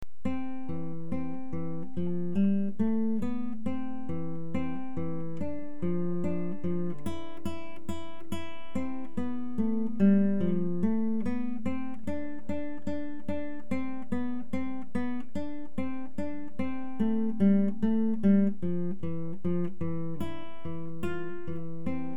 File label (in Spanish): ejercicio de guitarra